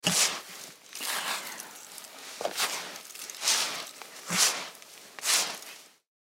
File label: Sand Scoop Shovel, Foley Sand Scoop Shovel Pail Toy Multiple; Playgrounds; Blastwave